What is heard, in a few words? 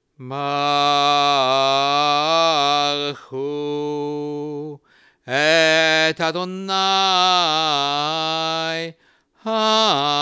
Barekhou; la liturgie du chabbat; offices de chabbat